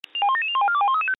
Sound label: SelCall Carabinieri; SelCall code used by the Italian Carabinieri